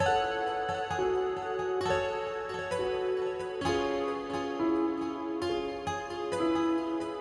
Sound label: Dragged sample